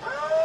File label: pump start; rigs of rods